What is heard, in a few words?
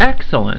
win battle; Galactic Empire